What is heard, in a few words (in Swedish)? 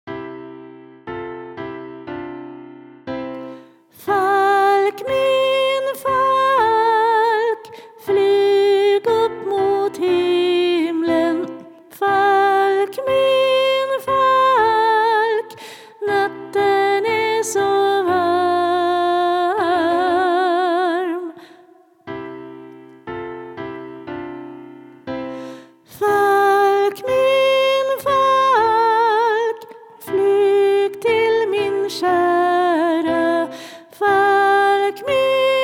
Falk min falk sopran; stämmor; fredagskoren